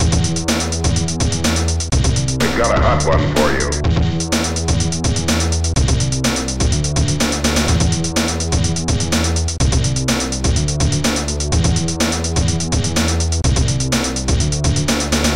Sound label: MUSAK